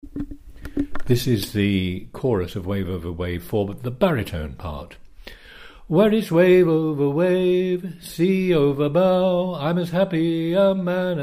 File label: baritone